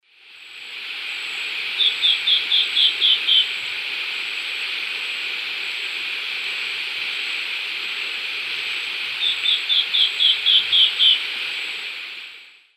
Myrmoborus myotherinus, song female; S. America; Eastern Lowlands

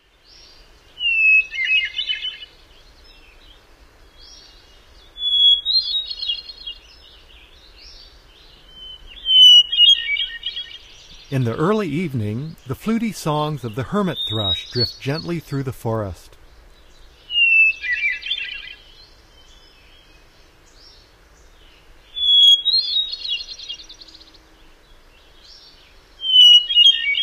Hermit Thrush, Bird Sounds; North America; Common Western Species; Know Your Bird Sounds

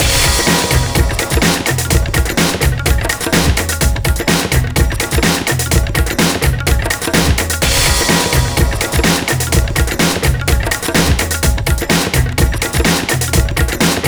Drums, Rock

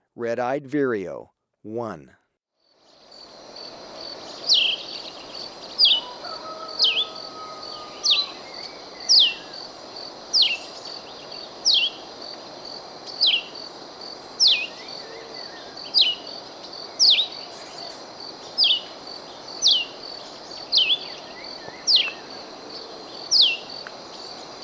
Red-Eyed Vireo, Birds of Ecuador; S. America

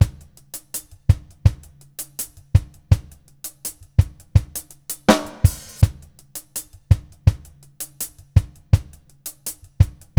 Drums, Jazz

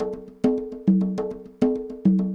conga 100, latin, 100bpm; Sound Effect Library

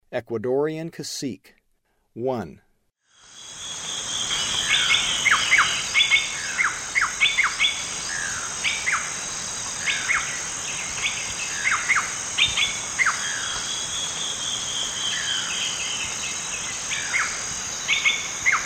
Ecuadorian Cacique; S. America; Eastern Lowlands

